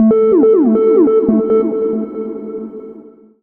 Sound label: TECHNO EXTRA 140